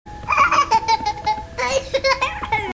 laugh

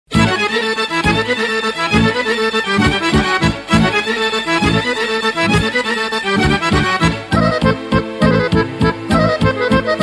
zikino kolo

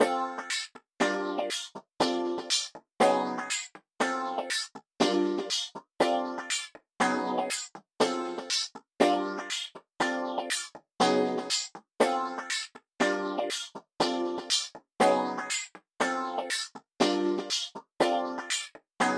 Guitar

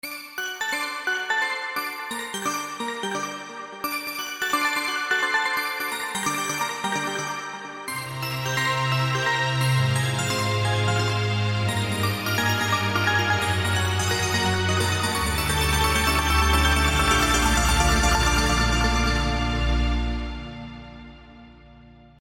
Bells Strings

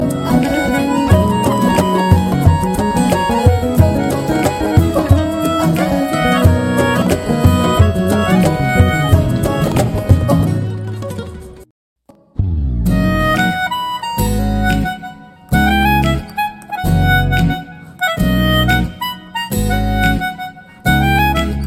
groove

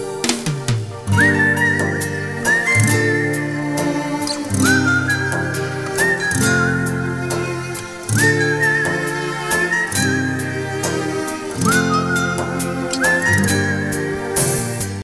beautiful whistle; alternative

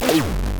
zombie gulp; games; ringtones

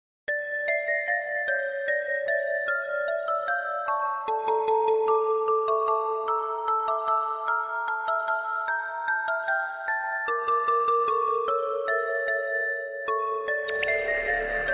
Creepy ringtone

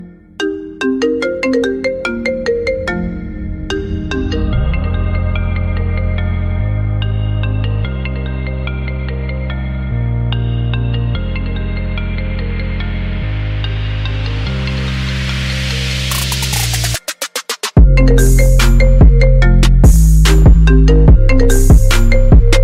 phone

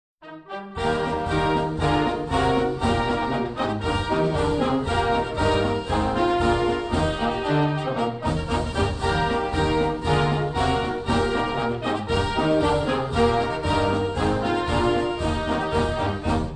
military marines

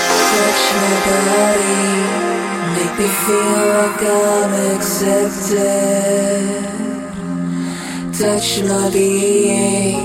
VOCAL woman

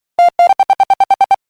business alert; ringtones

